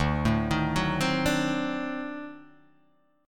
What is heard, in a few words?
D 7th Flat 9th D down Guitar Open D